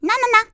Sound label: "nanana"; ses efekt